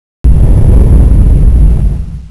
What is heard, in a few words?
tomb door